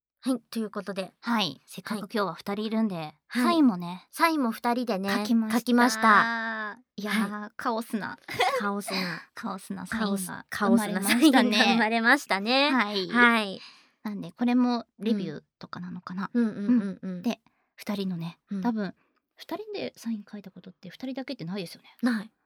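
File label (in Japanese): ダブル耳舐め; エロエロおねーさん; ダブルサイン耳舐め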